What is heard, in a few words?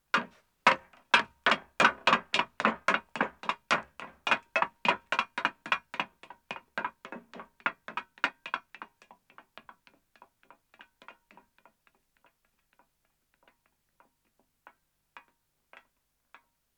Metal Stairs Descending, 1 Man, Footsteps; BBC Sound Effects Library; Footsteps II